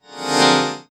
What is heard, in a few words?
a sound that is a, dream